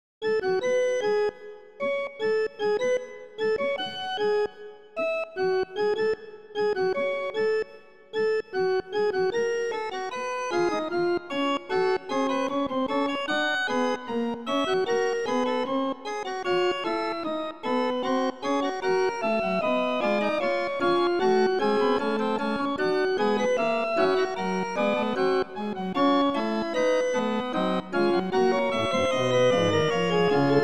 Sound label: 50 edo organ